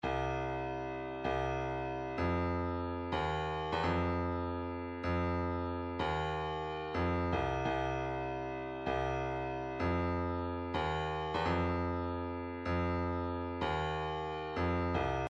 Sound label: 126 kick, midi; dirvish